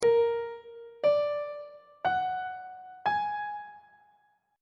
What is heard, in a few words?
b flat augmented 7th chord intervals on treble clef